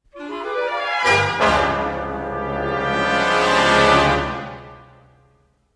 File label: Dramatic Impact; Ren & Stimpy - Production Music